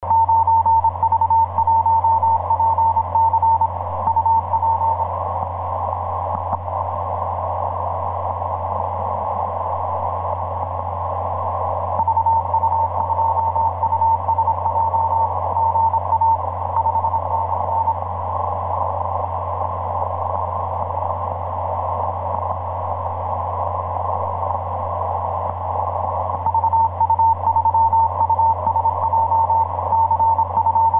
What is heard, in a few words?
15m cw; Asia; 9v1pc; vu3usj